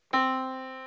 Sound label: Yamaha Disk, piano sound